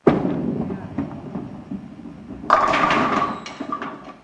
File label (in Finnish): keilaus